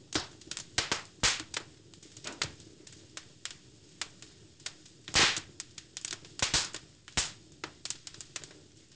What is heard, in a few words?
fireplace; Pirates Of The Caribbean